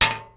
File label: metal; Mafie 1